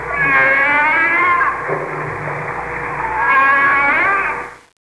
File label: whale